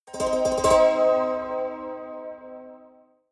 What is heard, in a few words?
haunted strings roll high